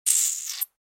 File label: rat death; STALKER; customnpcs